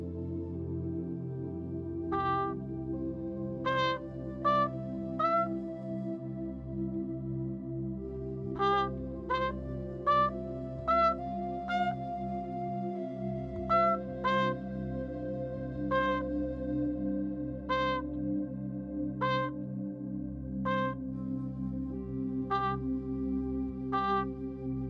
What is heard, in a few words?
Ear Training Exercise Level One, trumpet